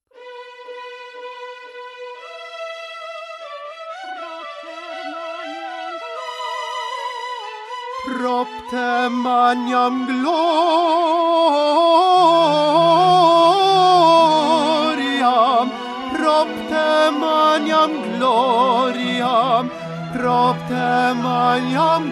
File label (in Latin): propter magnam tenor